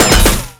DBgun Metal, Damage

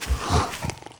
War beast peace walk

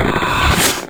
Yggdrasil Attack

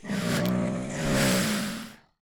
Elder peace idle

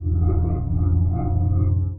Armed Ghost idle

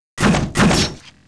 war attack

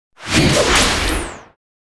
Destroyer attack

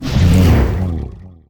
Peace idle; Eldcrochi; Mob

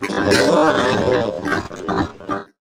Pig Peace idle

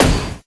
Mace Metal, Damage

